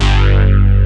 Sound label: BIG BASS; Pro-Rec - Synthsonic; BASSES